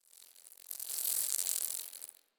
Insect Fly By; Ambience; Misc Outdoor; Warframe; Infested Eris

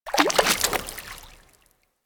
Fish Jump Splash F; Eidolon; Warframe; Ambience; Eidolon Plains

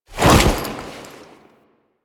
Foley Melee Sweep; Warframe; Kahl

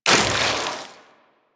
Inf Boomerang Melee Throw 3D; Warframe; Weapons; Infested

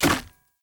Sphere Polearm Medium Impact Wood Hollow Large; Player

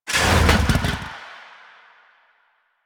Power Slide Stop, Motorcycle; Motorcycle Ninety Nine; Bullet Jump

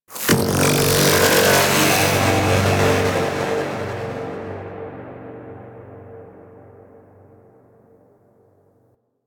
Void Tower Round Started; Warframe

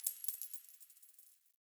Coin Drop; Warframe; Ambience; Nineteen Ninety Nine

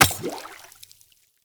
Throwing Knives Impact Water; Weapon Impacts; Player; Environment

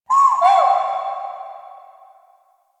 Deer Ruckus Vocal; Warframe; Quests; Entrati Labs

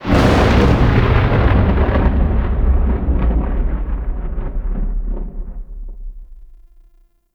Invasion Thunder; Warframe; Cetus

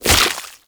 Tree Seed Explosion; Ambience; Warframe; Infested Eris

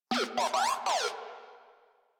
Moa Compassionate Vocal Greeting, Pets; Warframe

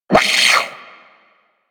Sentient Brachiolyst Pain; Enemies; Barks

